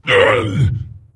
Wound Light; Jaraxxus

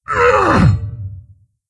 Attack, Creature; Steelbreaker

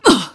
Wound; Sif

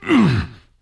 Wound Light; Varimathras

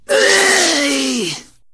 Wound Crit; Ionar

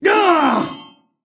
Wound Crit; Stormcaller Brundir

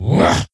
attack, creature; Shadron

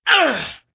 Attack; Keleseth

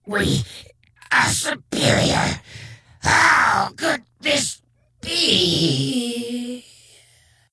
death, creature; Shadron

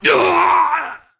Wound Critical; Varimathras

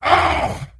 Crusher Wound Crit; AnubarCrusher

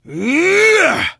Attack; Prince Valanar

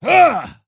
attack; Skadi The Ruthless

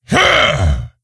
Attack; Kologarn

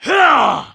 troll attack; Halazzi

